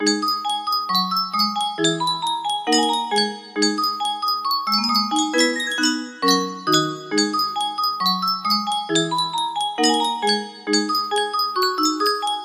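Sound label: music box melody; shovel knight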